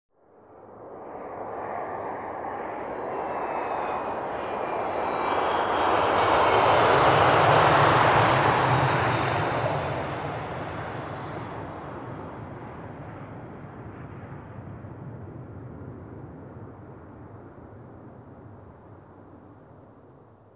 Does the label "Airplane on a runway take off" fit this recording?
Yes